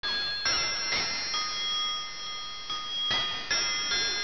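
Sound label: WALL CLOCK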